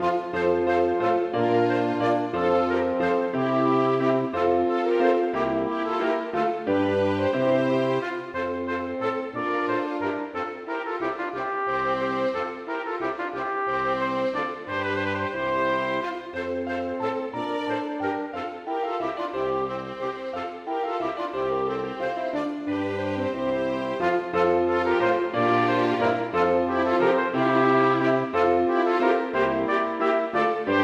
Paspied for trompet og lite ensemble; Aamodt

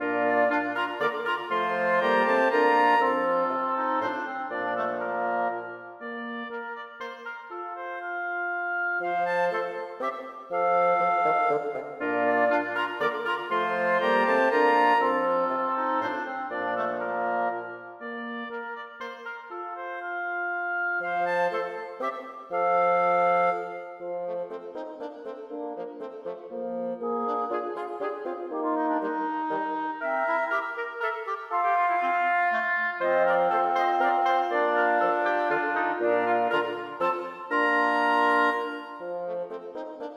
Menue for blåsekvintett; Bang

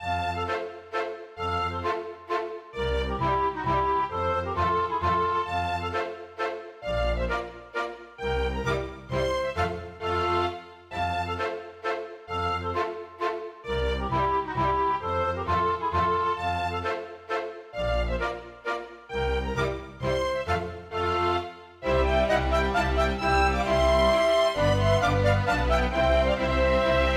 Polka Masurka; storgårdsmusikk

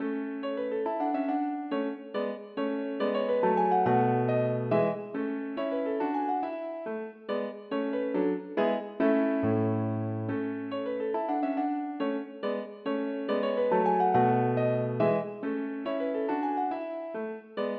Menuet for piano

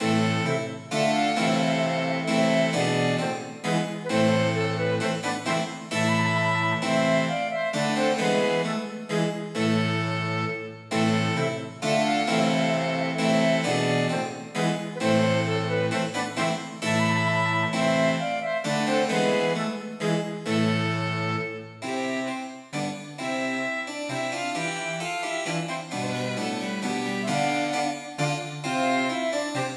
Menuet for fløyte, fiolin, cello og cembalo

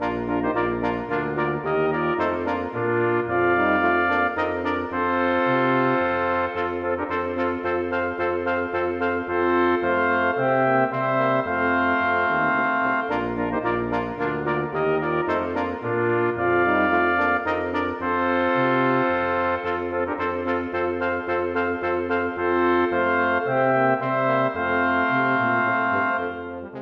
Menuet for messingkvintett; Aamodt

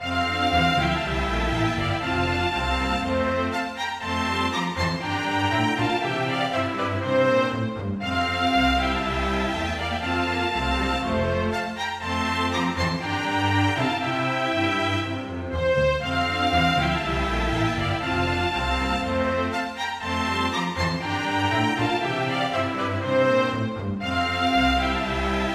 Marchs for strykekvintett; Bang; Marchs de Brandeburg

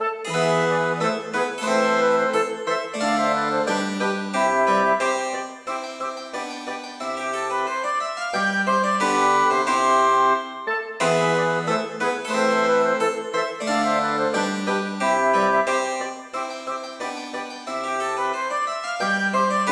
Gavott en boure for blåsekvartett med cembalo; Hertz

